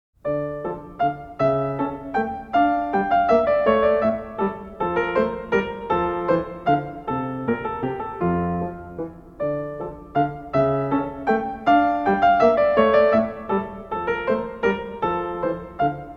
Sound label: Menuet In D Minor; Magrath Book 04